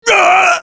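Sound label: pain severe, French; Douleur intense 1; Sniper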